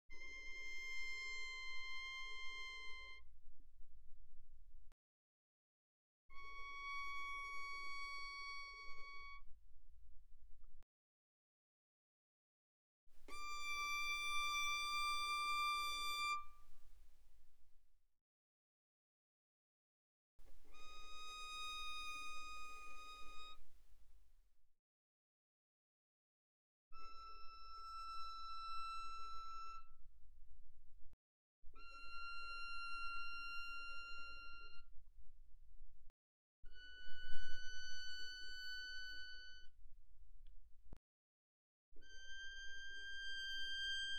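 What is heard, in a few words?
Violin arco pianissimo sul E C6 B6; Strings; violin2012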